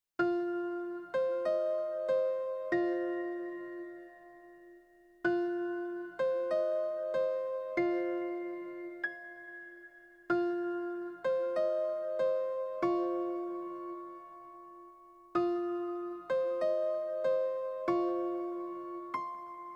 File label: Suspense piano; Production music; MEDIA MYSTERY